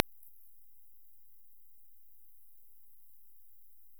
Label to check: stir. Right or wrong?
Wrong